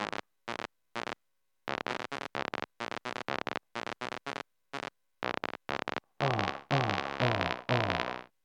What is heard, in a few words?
mangle env